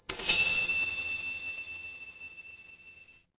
instrument chime bar

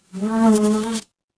animal insect

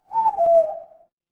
animal owl

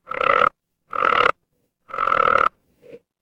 animal frog

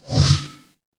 ui delete

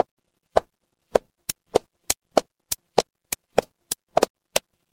footsteps concrete

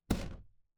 retro game hit

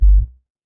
ui beep

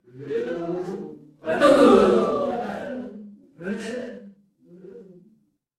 crowd gasp

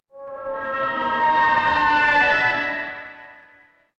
transition riser

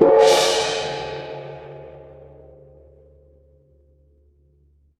instrument gong

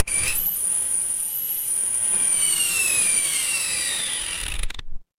mechanical machine

